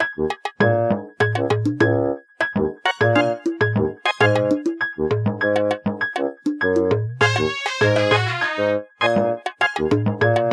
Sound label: Got Key, Carrying; Pikmin 2